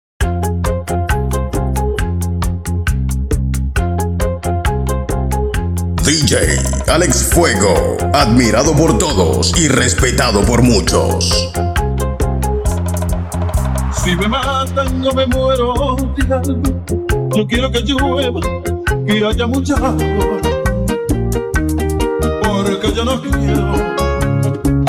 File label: 130 BPM